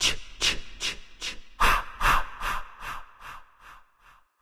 Ch ch ch ah ah ah Short; friday the 13th killer puzzle

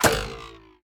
Harpoon Hit Tree; Gameplay Wpn

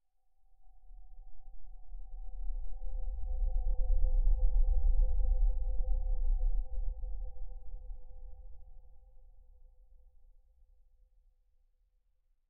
music - calm - future ambient random; You Are Empty

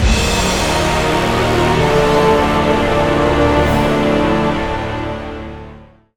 Skirmish Music stinger; A Year of Rain

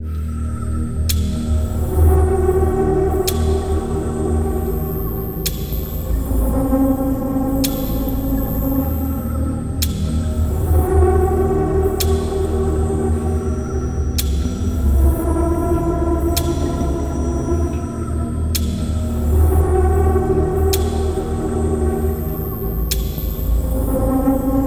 BGM Police

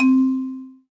Note Noise; lego my style preschool

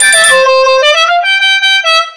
Car Horn; Hyperdrive Massacre; Pinballs

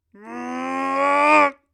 ceiling drop

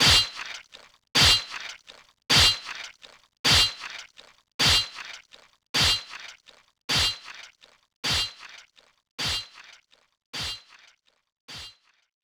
swords

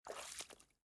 step in water

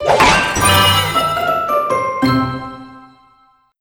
Crunchy Finish

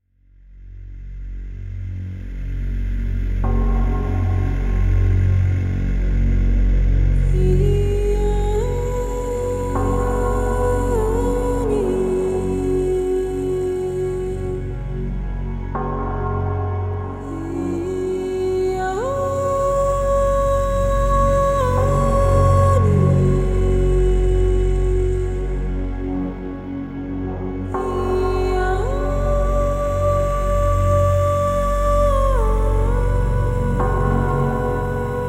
Tension After The Battle vocal; Real Warfare 1242

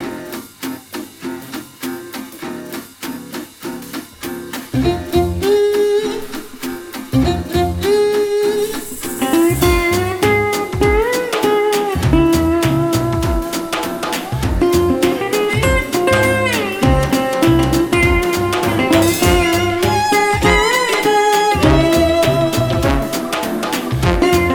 Music French Quarter Ambient; Music French Quarter Ambient Stub; Costume Quest 2

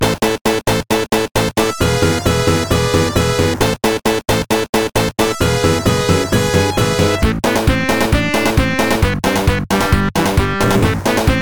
Boss Battle (Final Boss); Metal Glitchworm; Super Mario Bros RMX 1; Mari0

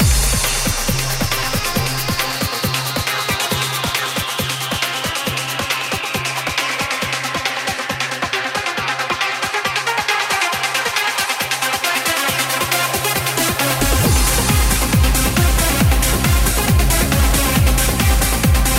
Music; Storm Racing G

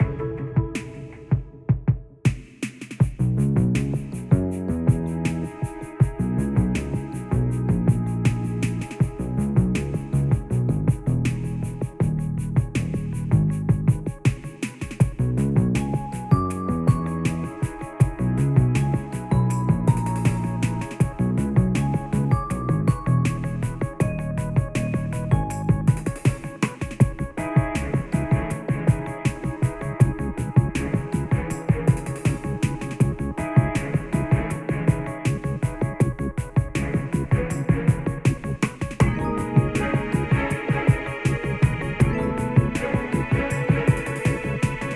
Sneaking Theme Standard Background Low Filtered; DeadBirdStudios SneakingTheme

